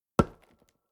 lumber tree

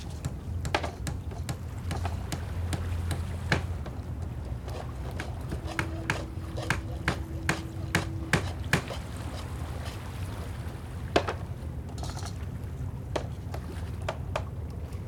port, ambient

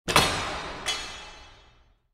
alarm upgrade armory; interface

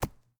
arrow dirt, attack impact

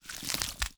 gather meat

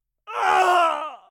male death

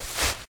fs sand, singlesteps

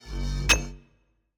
mine stone expire

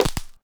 arrow wood, attack impact

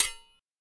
shield metal, attack impact

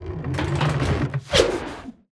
ballista attack, siege

